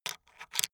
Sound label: makeup box put down; haus-garten; badezimmer